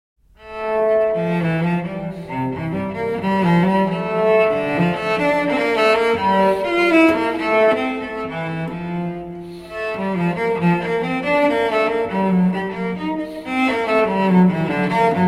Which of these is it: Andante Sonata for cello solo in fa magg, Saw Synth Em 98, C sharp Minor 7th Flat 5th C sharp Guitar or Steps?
Andante Sonata for cello solo in fa magg